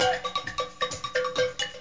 Dance Grooves

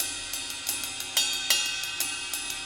Ride Riff, cymbals; Sonic Foundry (Sony Creative Software); Joe Vitale Latin Percussion

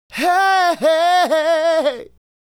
Male Solo, Hip-Hop and RnB Vocals

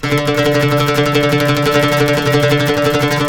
TREMOLO, Turkish Oud

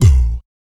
VOC PERC, Vocal Planet Jazz FX BASS PERC

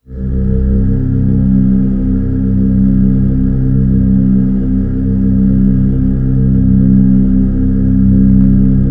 C2, SynthLine; NiceBeats

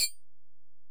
Ac Perc, Drum Machines Alesis DM-PRO

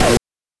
BANK2 SFX, NES DPCM; MIDINES

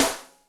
SnarfRim, Electric Snare, Yamaha DTXpress

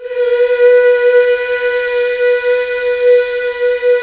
MMHH B4; Best Service Gigapack 1

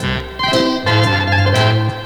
Synth Melody; Eclectic Electronica

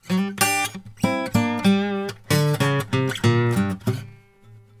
Blues Guitar Samples; ILIO; Fretworks; 96 HIRUM LICK

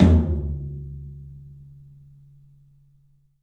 3-D Percussives, LOW Toms

